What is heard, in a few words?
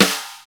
SNR, Cheez Snrs, Roland; Club-50 - Foundations Roland